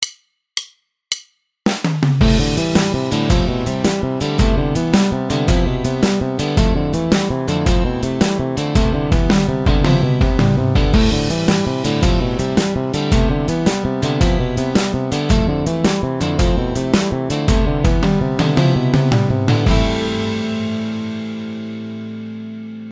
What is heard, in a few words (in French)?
tapping